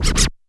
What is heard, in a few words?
F#0 Scratch Pull, Yamaha PSR-E323 Standard Kit